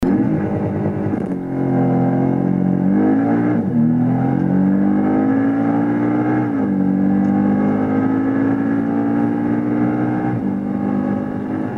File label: Porsche Boxster